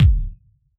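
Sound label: bass drum Analog, Yamaha DJX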